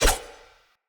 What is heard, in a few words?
Throwing Knives Throw; Weapons; Pothia